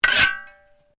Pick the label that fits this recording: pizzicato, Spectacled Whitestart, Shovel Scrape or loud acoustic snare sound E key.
Shovel Scrape